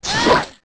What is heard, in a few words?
Gerudo Hit